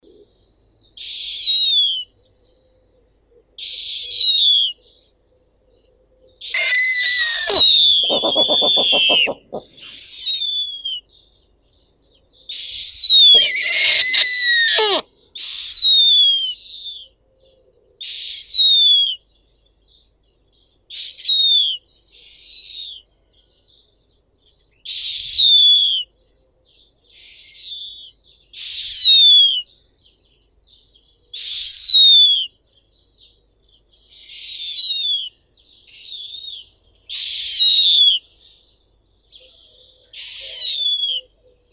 sova belaya nyctea scandiaca ptenets, zvuki prirodi

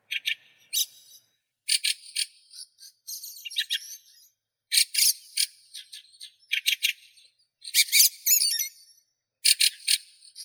drozd ryabinnik turdus pilaris

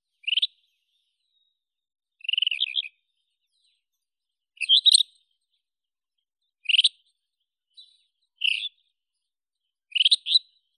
solovei tugainii cercotrichas galactotes

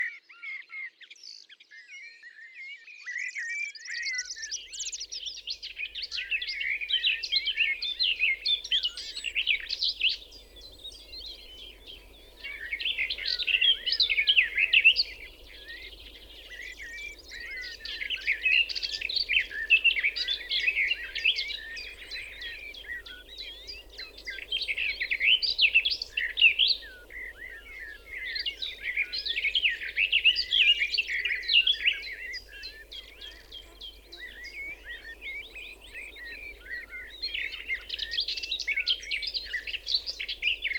sadovaya slavka, zvuki prirodi

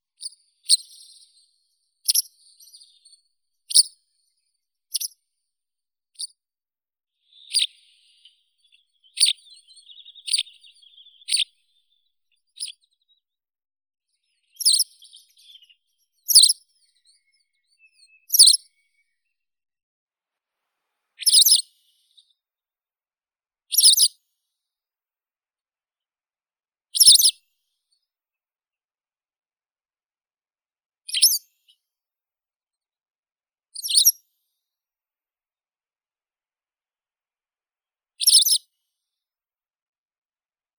tryasoguzka belaya motacilla alba, zvuki prirodi

